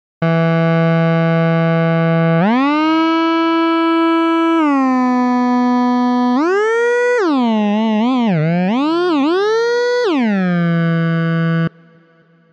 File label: portamento slow